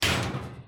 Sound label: Drawer Hit x2; Soundeffects